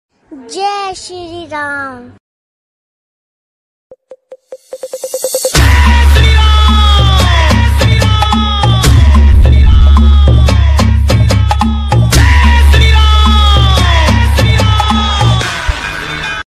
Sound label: notification ringtone message tone sms tone; jai shri ram